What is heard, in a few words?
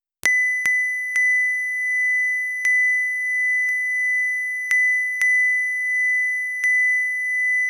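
very soft but loud notification; alerts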